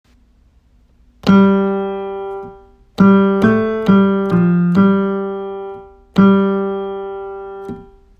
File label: Starting Pitch